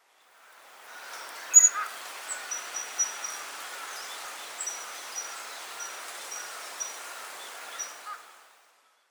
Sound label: Amur mushikui; yanagawa